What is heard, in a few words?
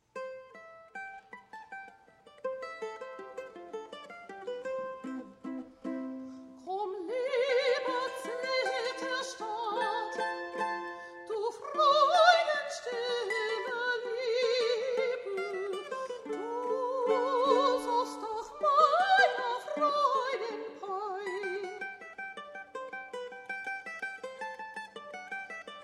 zither; Mozart